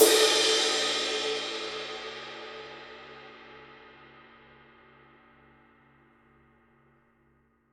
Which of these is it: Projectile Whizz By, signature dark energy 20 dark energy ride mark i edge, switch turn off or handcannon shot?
signature dark energy 20 dark energy ride mark i edge